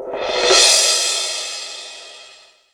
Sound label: rev cymbal; Ueberschall; Techno Trance Essentials; DRUMS